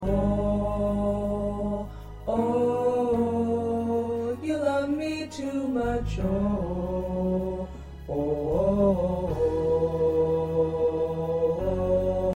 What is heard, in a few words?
Tenor